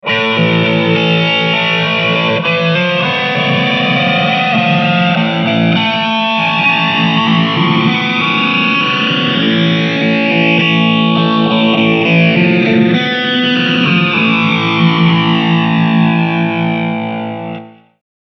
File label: Preset Flangulator